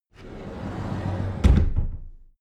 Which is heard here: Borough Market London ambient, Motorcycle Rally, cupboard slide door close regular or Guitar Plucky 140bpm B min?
cupboard slide door close regular